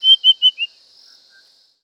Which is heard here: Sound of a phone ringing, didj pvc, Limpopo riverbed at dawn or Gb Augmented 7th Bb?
Limpopo riverbed at dawn